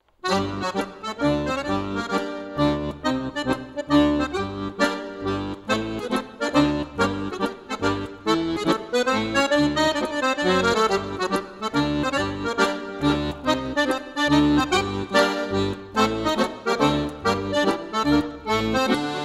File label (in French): Java de l'accordeon